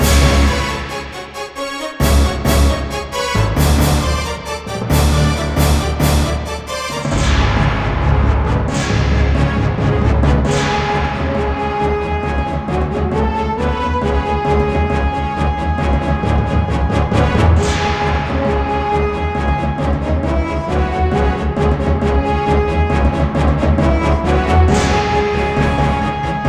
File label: zombie boss engage; garrysmod